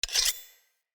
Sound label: Dagger Equip; Weapons; Enemy; Daggers; Sinecure Dagger